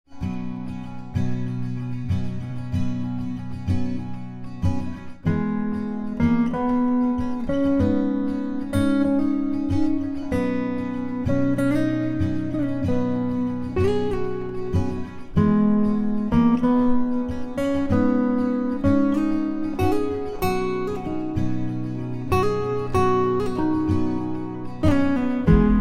chill guitar instrumental

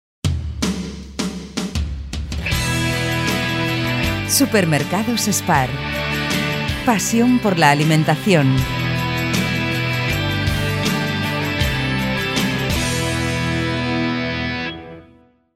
jingle; SPAR; PASION ALIMENTACION

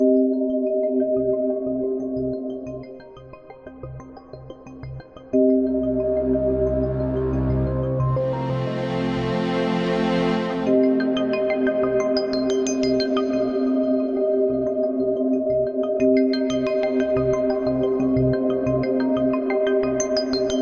Clean Dirty Rain, ambient y chill out

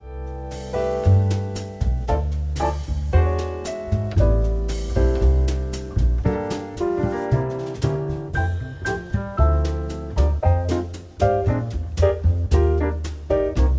Calming Instrumental Bossa Nova

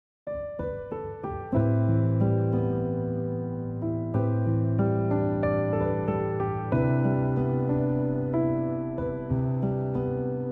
piano instrumental

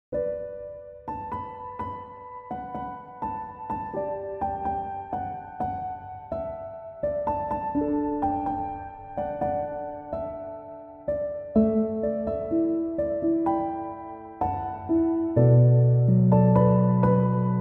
piano instrumental

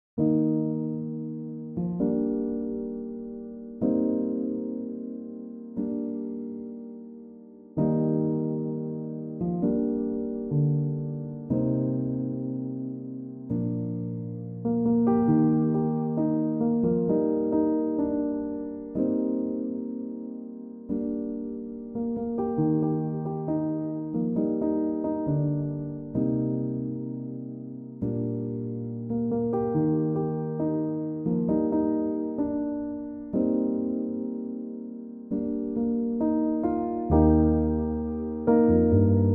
chill piano instrumental

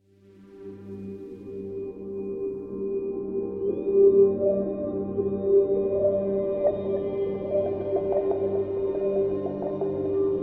Zen Water Music

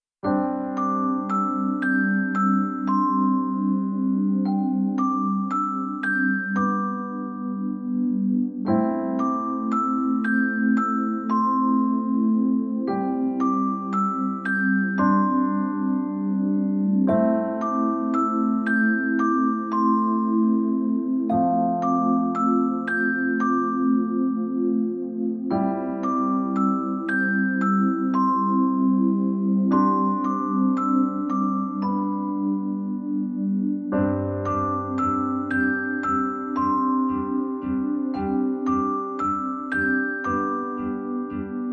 Piano Relaxation

